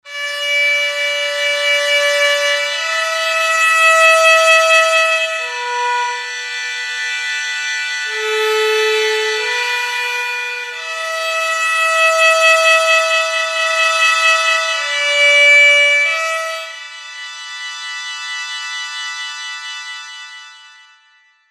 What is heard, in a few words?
Hichiriki